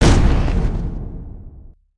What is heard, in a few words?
charge